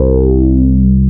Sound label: Talk C2; Mo' Phatt; Digital Sound Factory Mo' Phatt